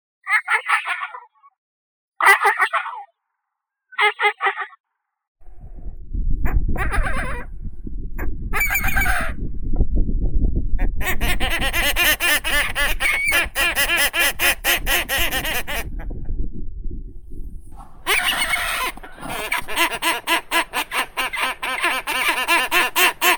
pomornik antarkticheskii stercorarius maccormicki; zvuki prirodi

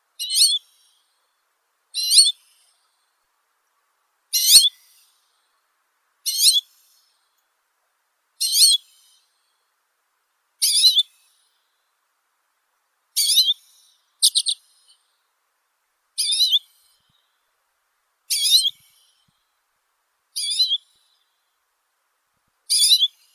chechetka obiknovennaya acanthis flammea

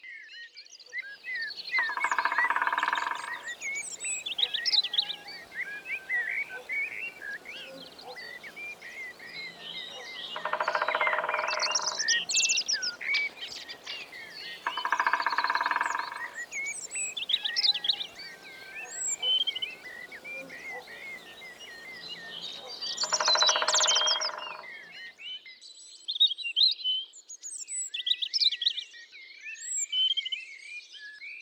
bolshoi pestrii dyatel

